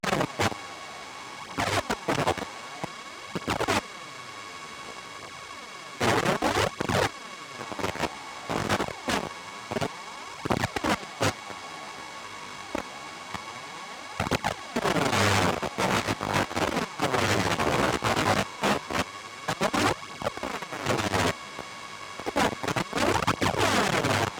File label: Transmission Apparatus am tuning short; USO